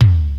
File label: reggae tom drum e key, tom drum sound tuned to the E note. Loudest frequency: 225Hz